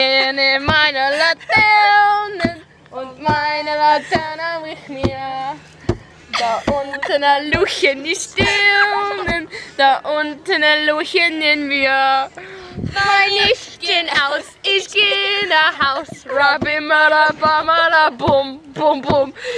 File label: singing in german